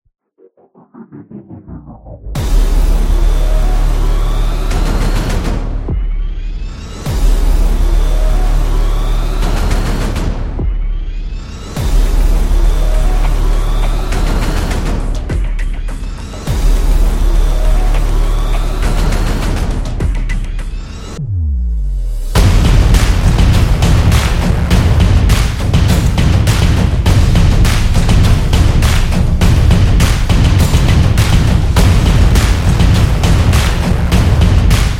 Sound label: Soccer Game, drums trailer